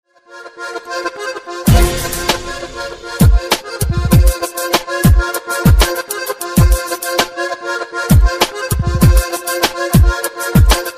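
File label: lezginka remix on accordion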